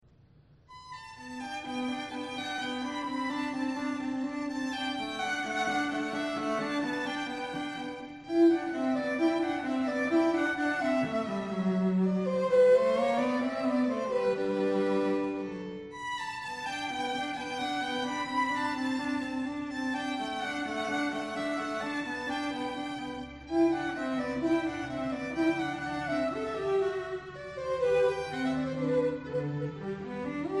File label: duos violin and cello; pleyel rondo